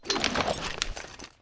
bow bolt, weapons; pbow

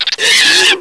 scream, headcrab poison; hl2

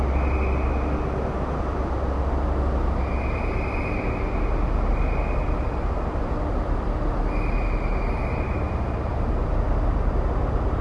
sub tunnel noise

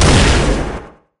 fire, enforcer; scmod; ut99

